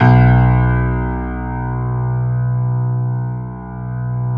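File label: piano, grand; Sound & Vision; Gigapack I CD 2; PIA GRAND 1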